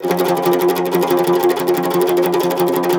CUMBUS Tremolo

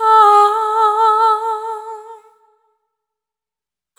Servitude, vocal samples; Sonic Foundry (Sony Creative Software) - Pocket Diva World Class Vocal Samples; Op-Erratic Loops

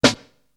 street, snares; Zero-G - Funky Elements

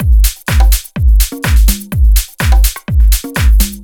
Conga Bounce, Drum Loops, 125 BPM; Sonic Foundry (Sony Creative Software); Underground UK House & Electro

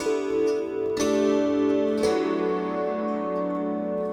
Kantele Down; Sonic Foundry (Sony Creative Software); Friendly Fire

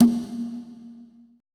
CONGA R; BPC DRUMS

